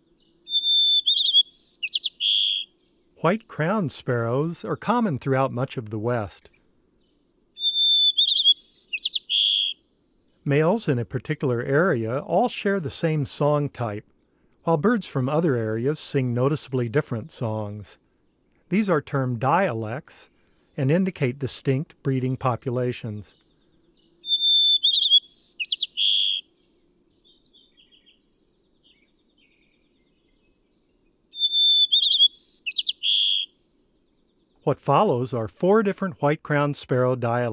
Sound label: White-crowned Sparrow; North America; Common Western Species; Know Your Bird Sounds